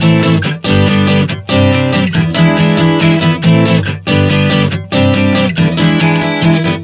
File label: Am-G-C-E7 140 bpm, acoustic guitar chord progressions